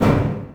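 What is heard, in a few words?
school locker; Tony Hawk's Project 8; XBOX; shops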